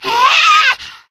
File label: spitter lurk, voice idle